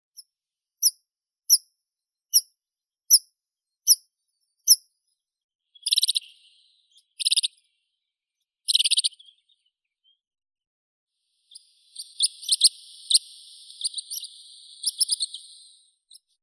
konek lugovoi anthus pratensis

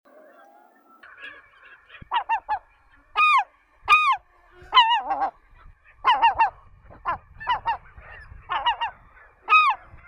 chaika tihookeanskaya larus schistisagus

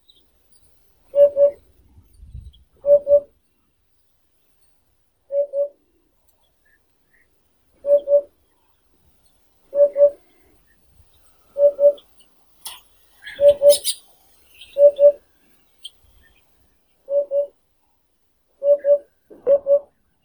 udod upupa epops

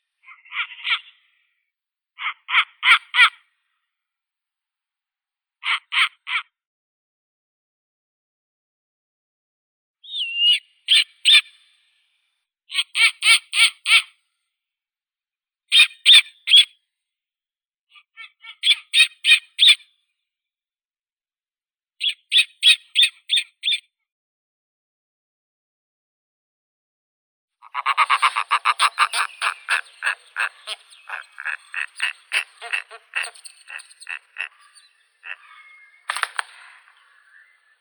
sova belaya nyctea scandiaca, zvuki prirodi

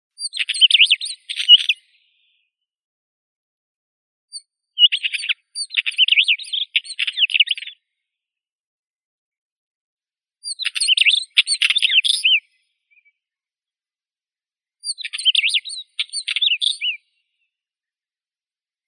kamenka obiknovennaya oenanthe oenanthe

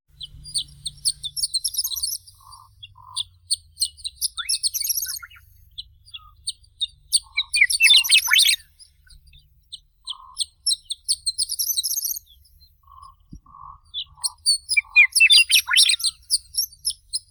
zhavoronok rogatii eremophila alpestris, zvuki prirodi

